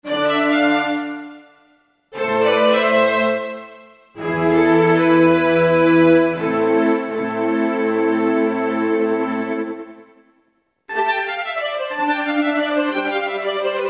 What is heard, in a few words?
ST Chamber, Yamaha TG77; Factory Presets